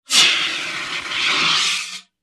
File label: High Pitched Fire Extinguisher Air Blasts, X6